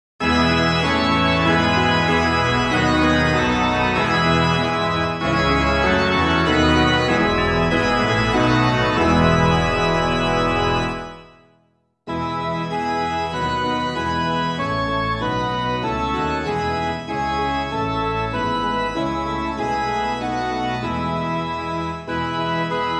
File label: midi; Lead Us, Heavenly Father, Lead Us